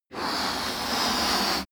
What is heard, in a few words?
balloon blow